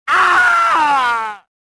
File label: death; reborn